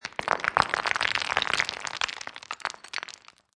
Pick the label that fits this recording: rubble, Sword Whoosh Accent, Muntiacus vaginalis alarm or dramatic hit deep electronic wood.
rubble